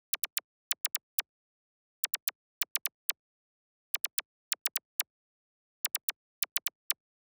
126BPM Zaps; Vengeance Samples; Klondike Kit

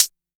Open Hihat; Vengeance Samples; VEE Cymbals

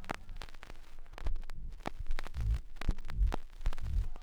Record Noise, Vinyl Record; Record Noises

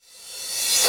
Cymbals Reverse Crash; Vengeance Samples

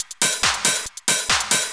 Loop 139BPM, Drums & Perc; G#minor

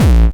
Bassdrums Hard Kicks; Vengeance Samples

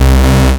Offbeat Bass C; Vengeance Samples

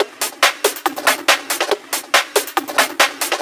Breakbeat; Vengeance Samples; VEC2 Loops

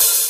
Open Hihats, Cymbals; Vengeance Samples

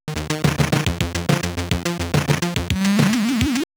Arcade Riffs

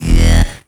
Synths C; Vengeance Samples

